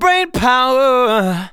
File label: vocals sung F#; Techno Trance Essentials